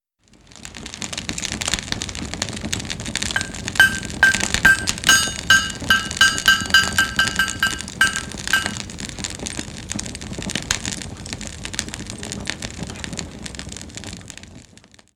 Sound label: blacksmith fire